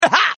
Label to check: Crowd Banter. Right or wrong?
Wrong